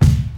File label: rich mids kick one shot d key